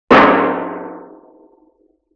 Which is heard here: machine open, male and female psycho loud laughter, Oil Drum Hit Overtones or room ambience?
Oil Drum Hit Overtones